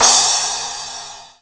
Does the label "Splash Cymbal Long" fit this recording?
Yes